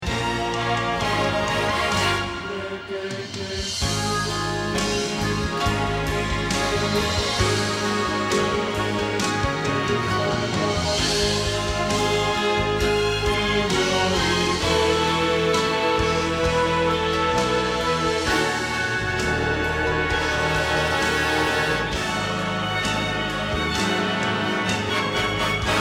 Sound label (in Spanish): Bajo